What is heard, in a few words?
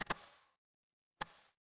ui click